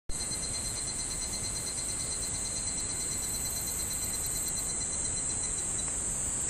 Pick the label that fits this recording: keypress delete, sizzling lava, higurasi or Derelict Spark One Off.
higurasi